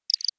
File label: contact calls audible